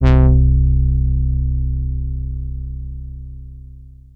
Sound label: SYNTH Brassy; Board